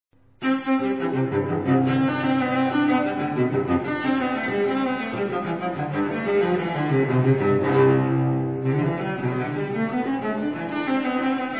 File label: Suite C Major, BWV 1009, Courante; tortelier; Suite 3, BWV 1009, Courante